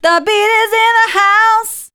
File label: voice spectral, ly short